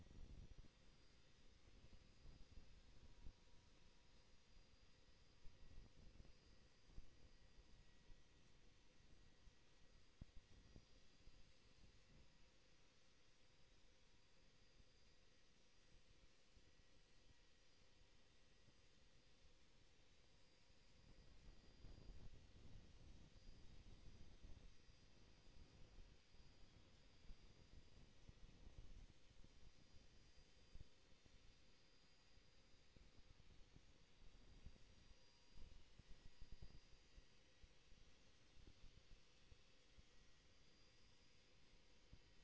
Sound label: HYDROPHONE 16000Hz; JASCO AMAR HYDROPHONE 740